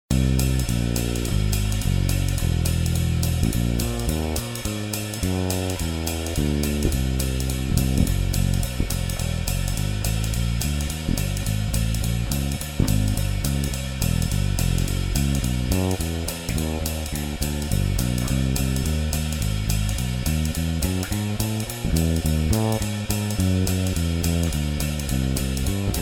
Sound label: C, Ear Training